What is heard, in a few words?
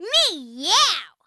Meow; P5; Mona